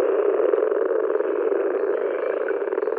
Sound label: Moor Frog